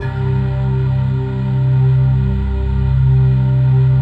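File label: PAD, atmospheric pads; Best Service; XX Large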